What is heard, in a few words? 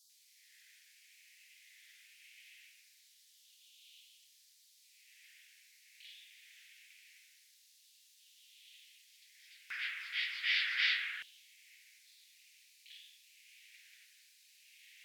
R poneli; Tarascon13